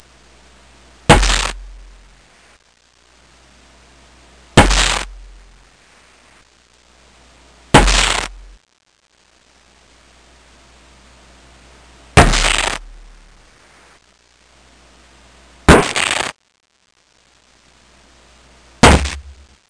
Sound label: Various Thumps and Scrapes